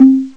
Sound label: slit low, oceania